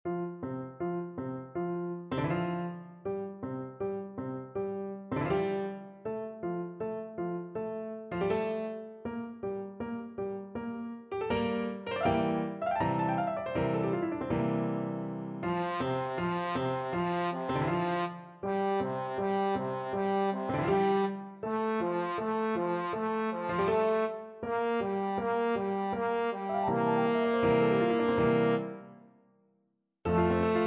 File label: trombone